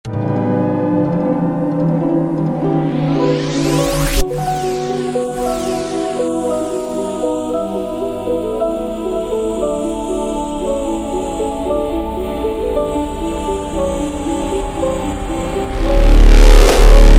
Hardstyle super Slowed

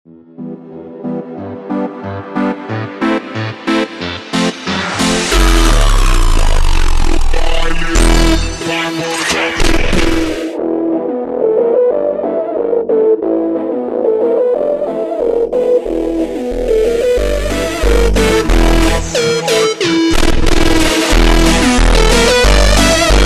Funk, ultra Slowed

